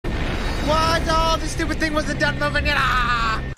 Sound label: raven aaugh